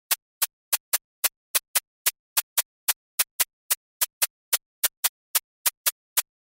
cabasa, Cabasa Short 808; Drums and Percussion; パーカッションのカバサ

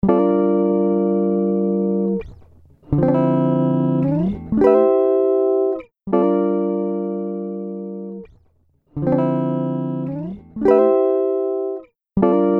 Guitar Comp, Electric Guitar; Omnipressor; Preset: 1177 Guitar Comp

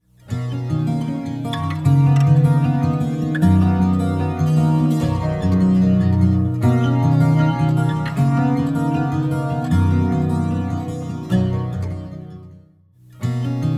Harmonizer Acoustic Guitar Dual Cents MicroPitch; H949 Harmonizer; Preset: 04 Cents MicroPitch (Dual)

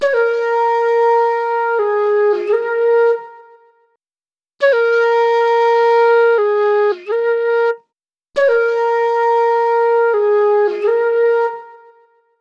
Flute Warm Solo Flute; Preset: Warm Solo Flute; UltraChannel

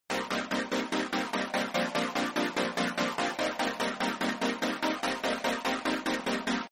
synth chord main; 1’22～の最後のセクション; バッキングシンセはメインに; Faturatorで歪ませ、サイドを上げて輪郭を調整; Synth Sounds1; Prophet Albino; Nexus4

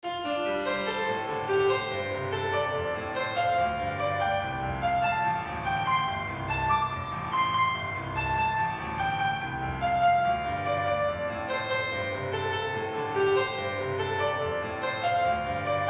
Piano Pentatonic Arpeggio; Native Instruments Kontakt Piano Uno; Music Mouse Pattern 4

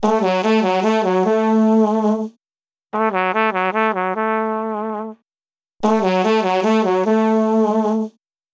Trumpet Big Band Trumpet; Preset: Big Band Trumpet; UltraChannel

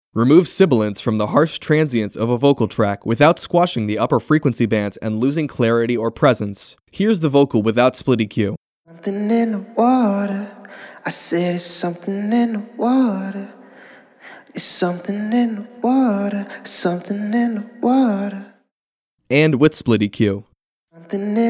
Repair Vocal; SplitEQ

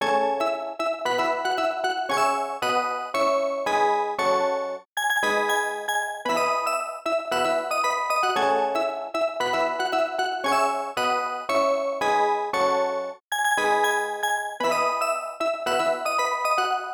Dynamic Slap Poly Synth; UltraChannel; Preset: Dynamic Slap

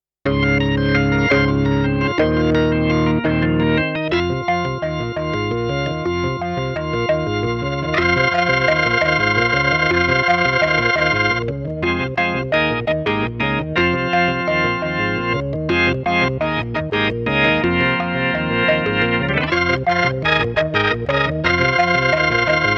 Progr Rock; dexibell vivo s2

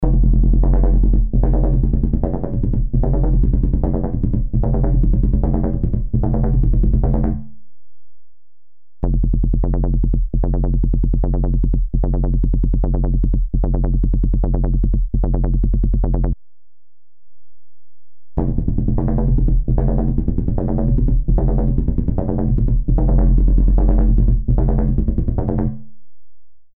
Synth Sequence; Spring; H9 Bundle; Plug-ins: MicroPitch (NiceChorus) + Spring (Imaginary Telephone Booth)

